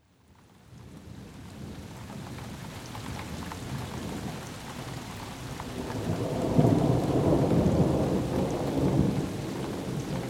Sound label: thunder and rain